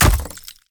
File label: cam click